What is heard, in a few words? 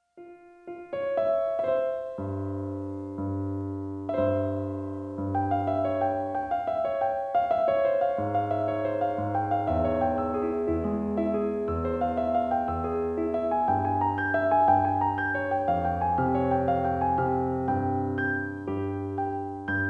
Piano